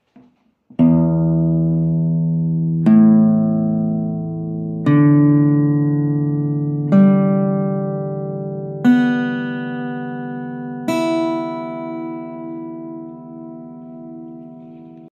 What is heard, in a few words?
Guitar notes; نت های گیتار